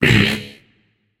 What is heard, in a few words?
notice; smite; achilles